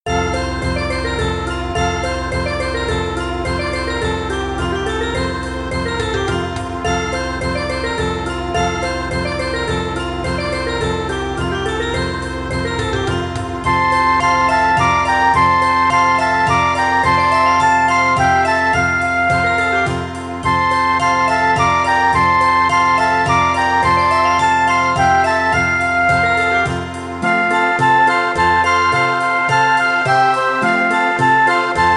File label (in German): kinderlieder kommet ihr hirten playback